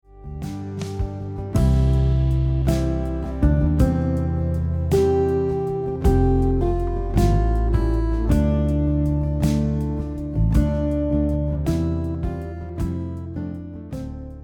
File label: guitar; Abide with Me